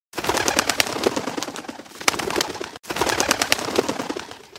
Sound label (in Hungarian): sms hang